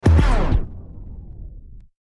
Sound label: Record Dj Scratch Spin; Record Dj Scratch Spin Slow Down Stop; Musical; Blastwave